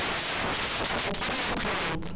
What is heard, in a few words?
noise